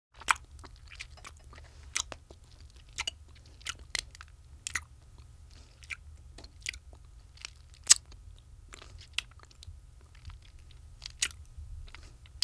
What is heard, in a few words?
eating Monkey Voice